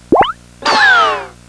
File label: run, Run away; katamari